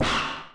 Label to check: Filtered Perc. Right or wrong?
Right